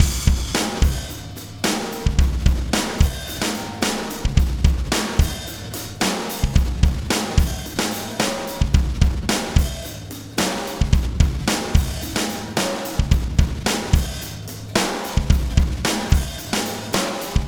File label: Beat, Processed Drumkits; Sonic Foundry (Sony Creative Software); Processed Drumkits Zero Gravity Beats; Solar Flare Beat